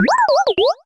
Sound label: cry; bounsweet